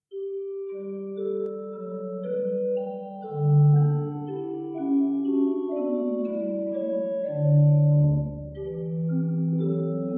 Prengel, pipe organ

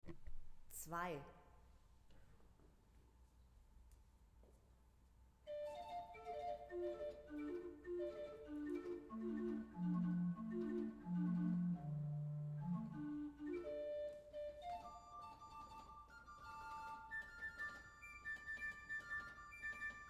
pipe organs; Weigle

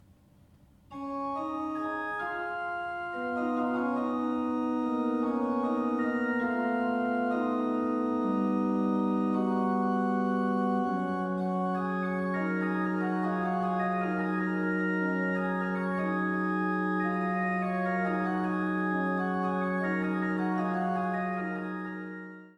pipe organ; Le Raphael Multiplex

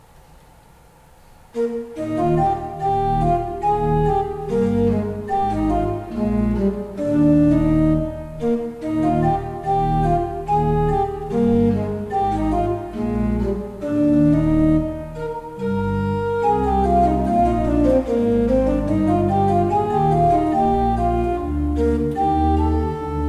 Ott, pipeorgans

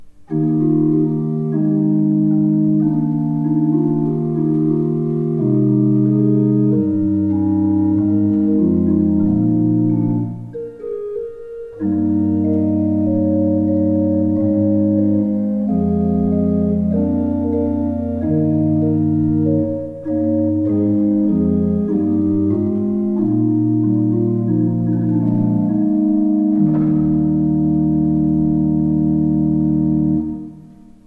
pipe organs; Steinmann

